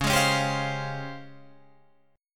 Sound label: C sharp Major Sharp 11th, Guitar Drop D